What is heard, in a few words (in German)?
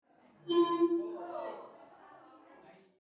Publikum Reaktion